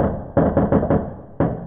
church, monastery; soundscapes

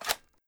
foley barrel pull, weapons

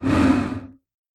vox idle

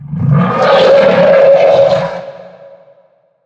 bloodsucker attack

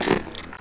gear

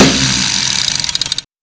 minigun wind down; weapons

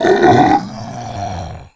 injured

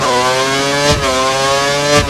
second, f1, cars; lwcars

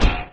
metal step, doom3 pinky

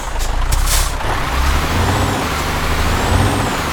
first, vehicles cars; truck actros; lwcars

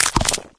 chomp, imp; doom3

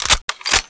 thompson reload

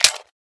30cal cover up, m1919; weapons

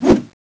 slash, crowbar; tfa cso; crowbar craft

